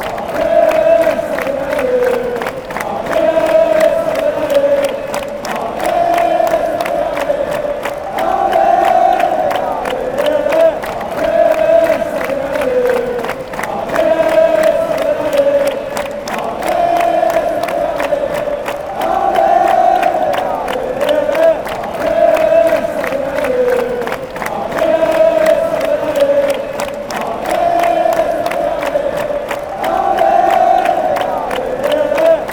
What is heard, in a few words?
come come, chants; stephanois; Association Sportive de Saint-Étienne Loire